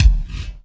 GATE BD, Gated Reverse Slapback Kit; drums; STYLE KITS (Hip Hop, Etc)

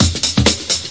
130 bpm jumping house, drum loops and breaks

